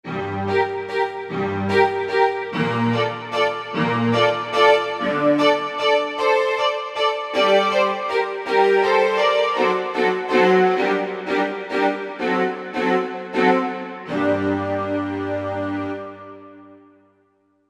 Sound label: ArcoString, Korg MSC-11S Brass